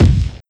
Kick Hard Oldy

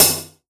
HiHat HClose; BJA Pacific

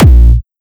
Kick Dist Big Room; Xkpl Klawz

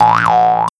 Perc Mouth Drum

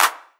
Clap Med

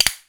Perc Castanet Double

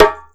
Perc Djembe Slap; Circ Afrique V4

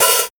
HiHat Open; pitchglitch samples; JoaoJanz

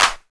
Clap; Pyrex; pitchglitch

